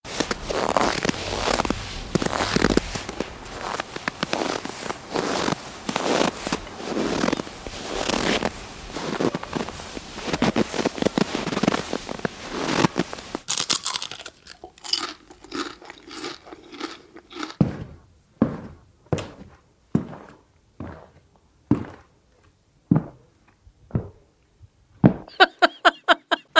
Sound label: Humans SFX; Symphonic Production